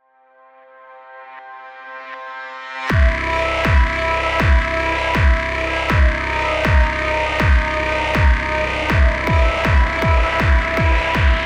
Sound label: Industrial